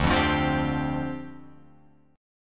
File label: D Minor 11th D down Guitar Open F; Dm11 strummed